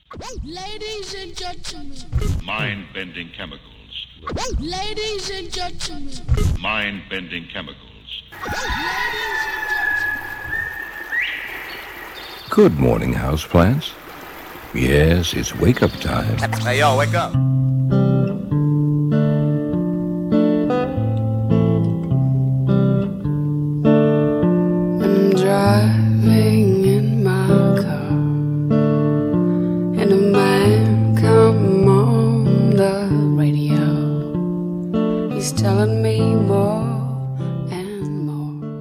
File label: brazil jingle